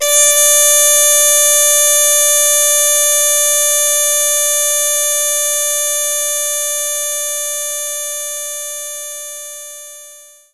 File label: Muted Trumpet D5; knights try